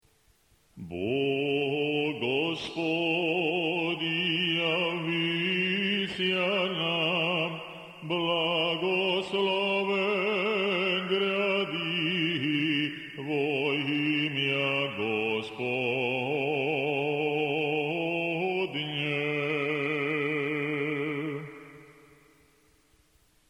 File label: Osmoglasnik VII glas